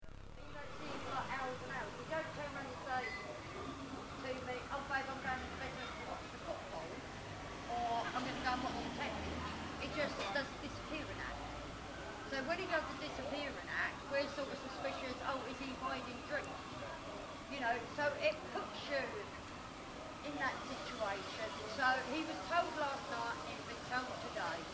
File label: clacton cafe, street recordings